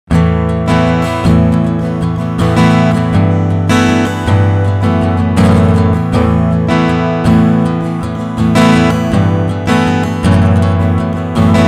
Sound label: Strumming EQ Half CoolTube